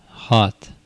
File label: hat